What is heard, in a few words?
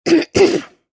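sounds throat tickle "khm"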